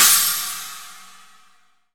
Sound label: crash; East Collexion; Drum 1 Dry